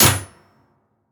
Metal Impact Light

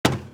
Footstep Metal; Footsteps

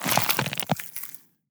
Gore; Damage

